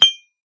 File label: Metal